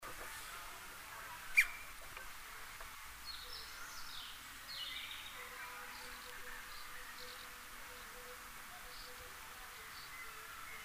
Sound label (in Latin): Pica pica; SETE